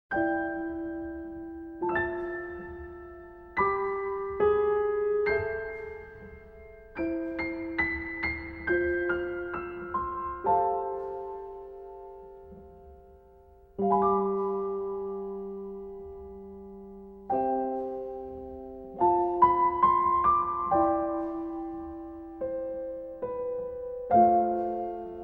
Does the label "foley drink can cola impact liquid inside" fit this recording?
No